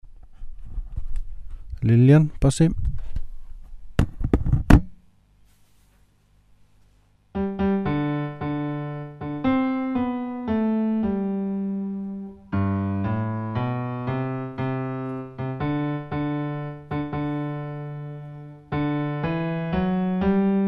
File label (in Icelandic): bassi